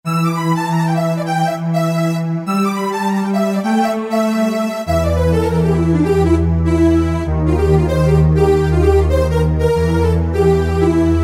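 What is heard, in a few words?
Voice Lead, Yamaha YPT-400; Voices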